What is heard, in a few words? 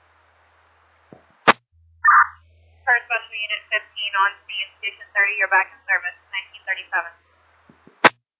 alert tones page received